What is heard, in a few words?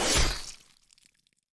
Blade; Scrap Weapons; Zomboid